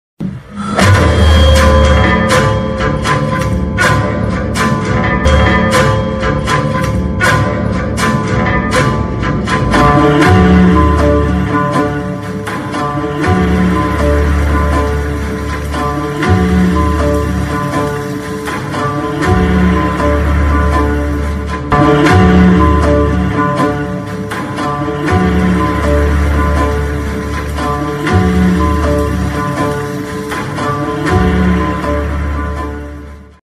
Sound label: interval bgm; raayan; dhanush